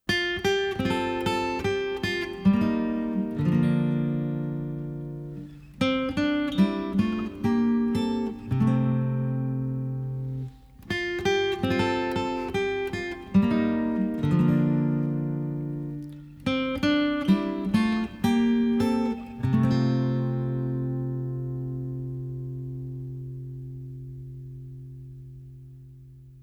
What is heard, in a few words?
Guitar Interlude